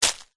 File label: foley footstep single soft shoe forest floor dead leaves twigs; zapsplat